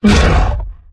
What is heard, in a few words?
Exertion Light; Evolve; Behemoth